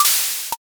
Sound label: syringe